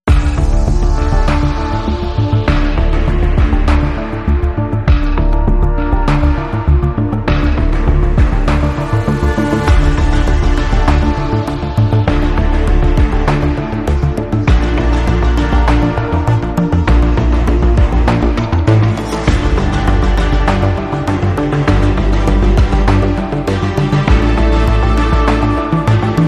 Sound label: Emergency Landing, No Melody